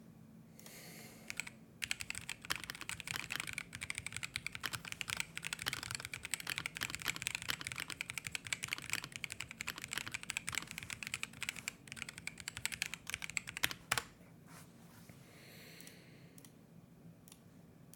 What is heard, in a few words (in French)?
son clavier cooler master mk770